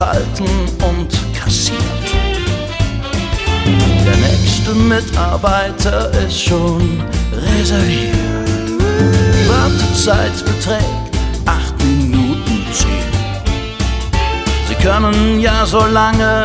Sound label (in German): Warteschleife